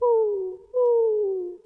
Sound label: spooky, notes